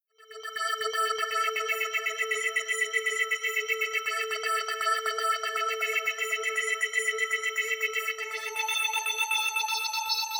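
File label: Synth Sequence Pulse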